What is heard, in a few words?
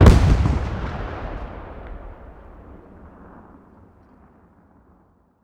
med dyn, explosions; gmod; lvs framework